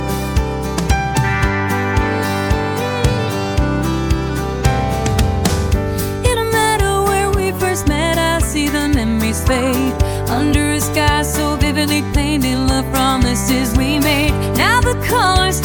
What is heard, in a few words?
upbeat pop heart blowout vocal; upbeat c w pop heart blowout vocals